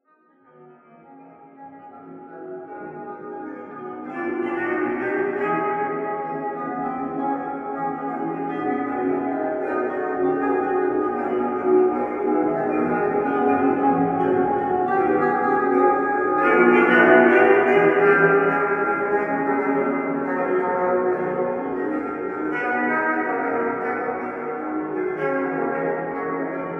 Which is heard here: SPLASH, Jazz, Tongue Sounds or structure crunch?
Jazz